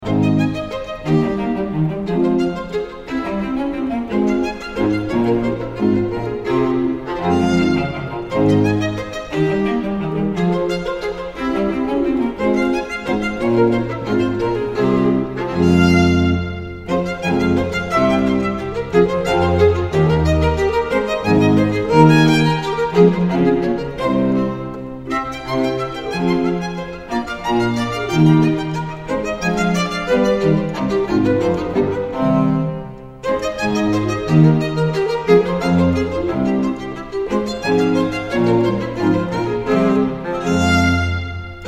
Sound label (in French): sonate pour violin et continuo, vivace; CORELLI